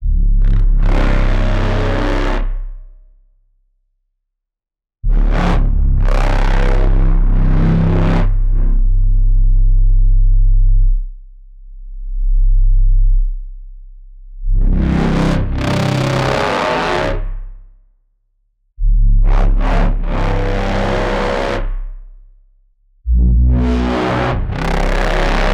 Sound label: doom dark evil bass